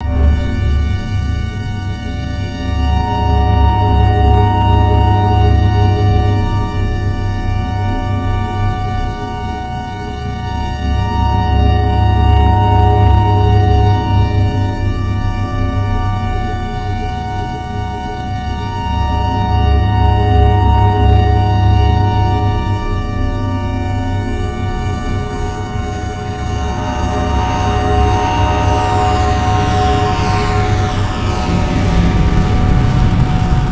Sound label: Resonance String; AMG - Prototype Drum & Bass